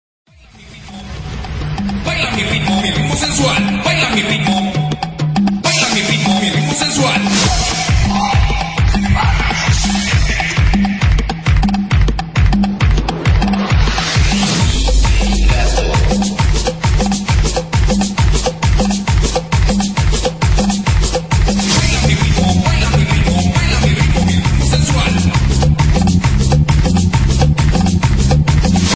tribal ringtone

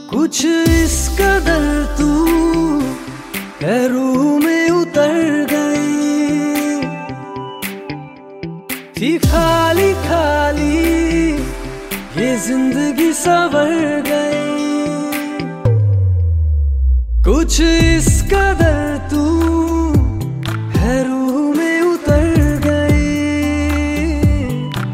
ringtone, bollywood ringtones

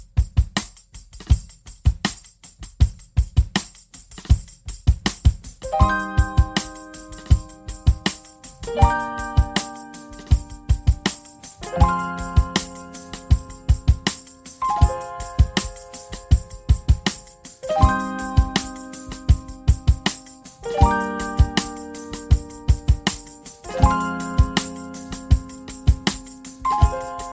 music ringtone, english

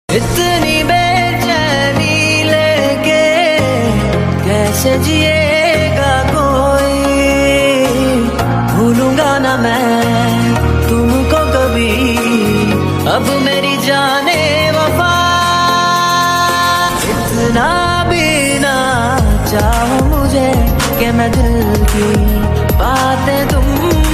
bollywood ringtone

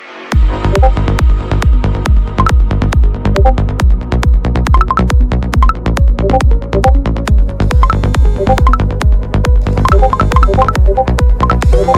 lg w10 alpha ringtone

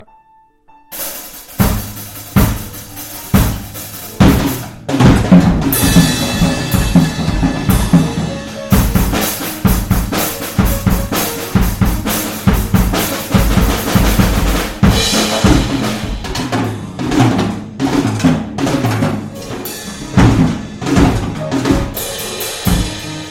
drum solo with backg ringtone

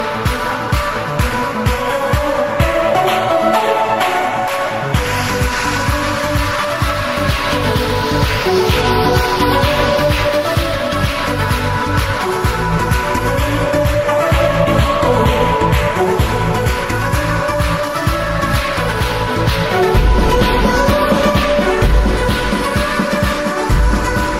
new guitar music ringtone

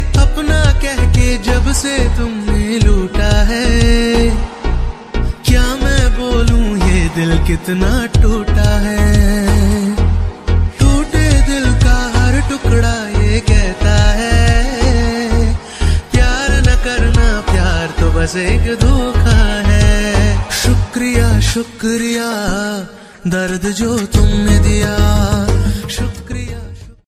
sad status ringtone, bollywood ringtones

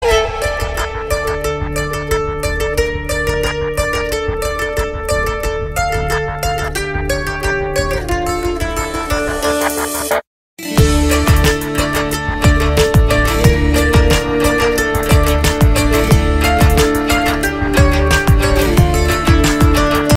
guitar theme ringtone

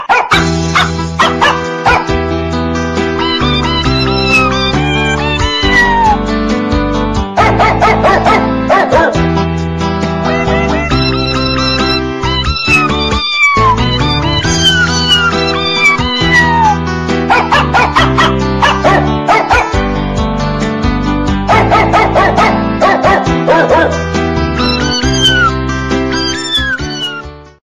cat dog singing funny ringtone

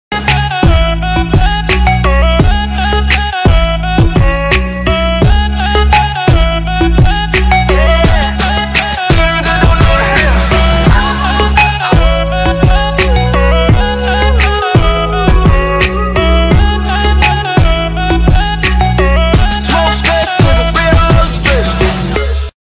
cool english ringtone

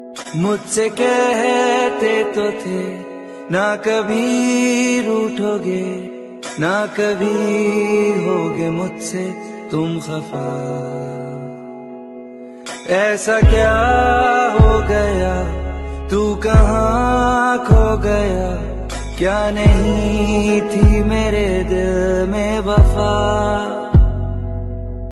dj remix ringtone

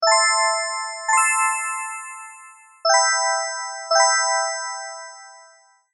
cute lovely background music sms tone ringtone

